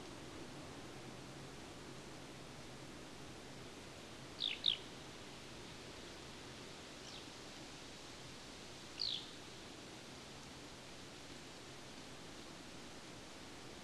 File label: Eurasian Skylark calls; Lingshan; nocturnal flight call; Beijing